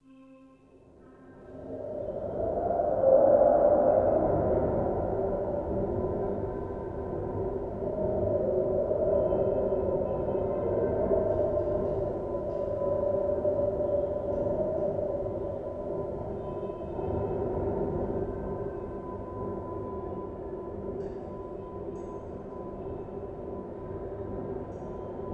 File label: wind; fatal frame 20th anniversary celebration soundtrack